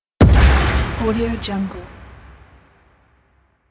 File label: dynamite explosion; 3dhome